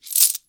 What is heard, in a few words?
coins hand